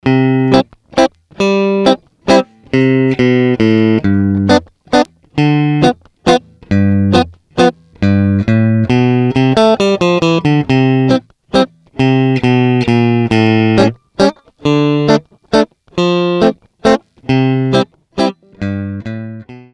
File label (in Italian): mazurca